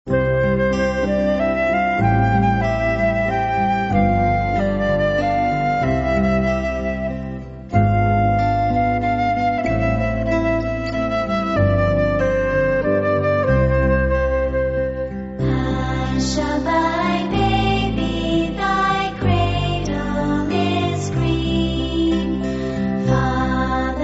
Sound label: Mother Goose Lullaby